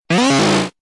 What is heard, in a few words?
roar 8 bit